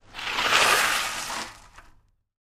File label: Bicycle Wheel Skid On